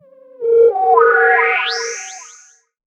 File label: Zap, PsyTrance